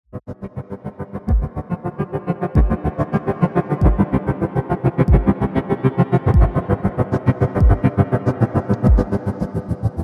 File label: broken piano, one minute soundscapes; alessio ballerini; world listening day 2014